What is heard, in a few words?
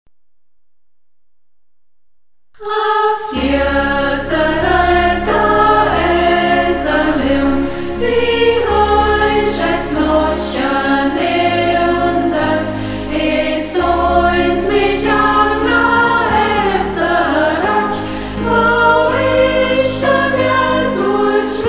chor, mundart; Ortsmundart: Burgberg; Af deser Iërd; Burgberger Chor; Hegt wird gesangen